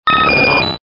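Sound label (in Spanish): "Grito de Pidgeotto", Pokémon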